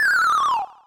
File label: fall; Undertale